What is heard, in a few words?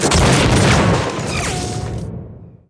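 musket; Alexander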